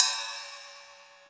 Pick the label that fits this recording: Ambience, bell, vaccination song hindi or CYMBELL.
CYMBELL